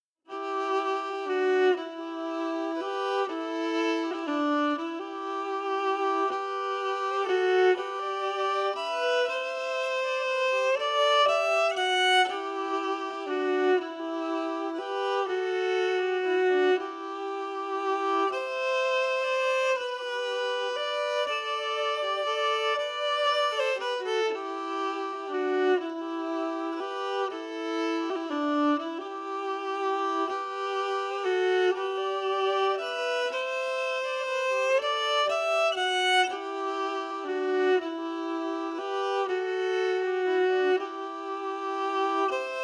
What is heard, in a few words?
Waltz harmony emphasized; Magic Waltz